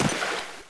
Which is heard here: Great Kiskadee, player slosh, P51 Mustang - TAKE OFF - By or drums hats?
player slosh